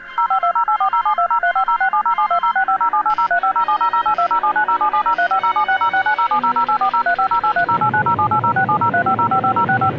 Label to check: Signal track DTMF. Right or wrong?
Right